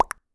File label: Notification; Among Us